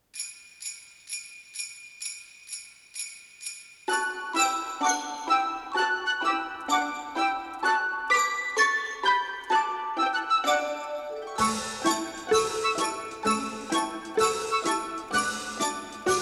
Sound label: Christmas cue